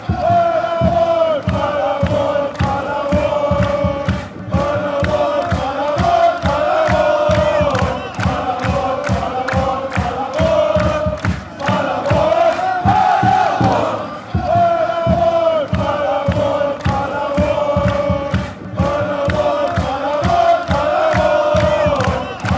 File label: paderborn fanchants